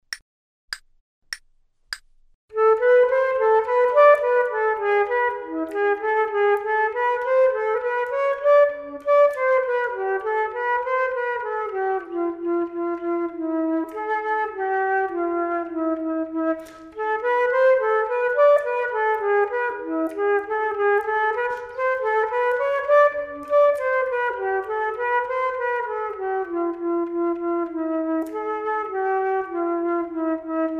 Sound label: Duets flute; Selected Duets for Flute Podcast